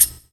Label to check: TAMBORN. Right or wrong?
Right